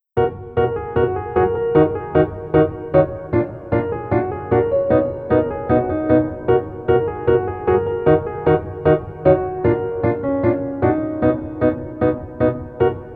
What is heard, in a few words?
Piano